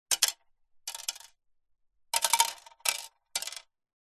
foley several small stones drop one by one on metal